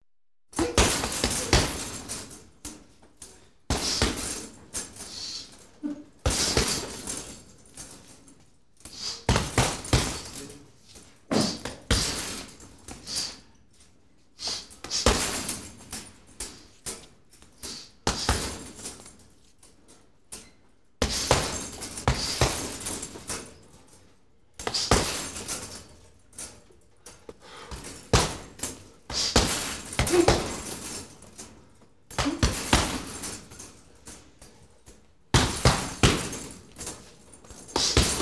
boks bokserskaya grusha tyazhelaya boksirovanie

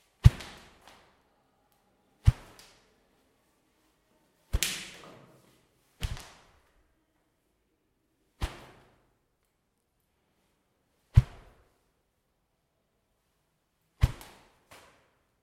probivanie naskvoz izgotovlen s primeneniem remnya

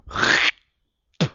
plevok chetkij

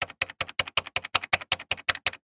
nazhmite klavishu vozvrata na klaviature kompyutera neskolko raz

